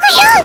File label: failsound; Senko-san-Hideru